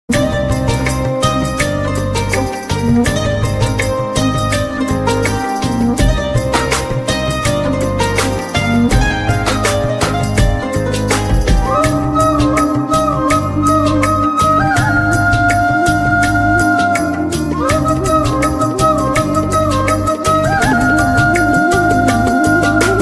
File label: thani oruvan love bgm